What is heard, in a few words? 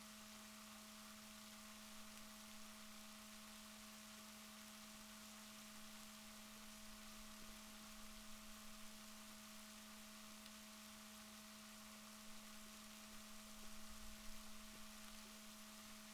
rorquals positif, Balaenoptera Physalus Chile